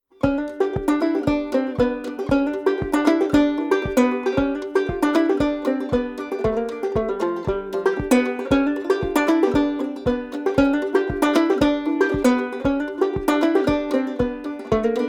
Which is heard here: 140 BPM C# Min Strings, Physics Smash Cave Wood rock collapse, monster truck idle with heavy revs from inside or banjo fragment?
banjo fragment